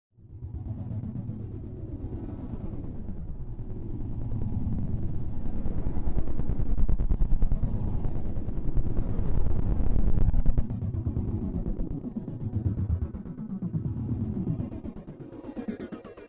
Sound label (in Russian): kosmicheskij inoplanetnij radiosignal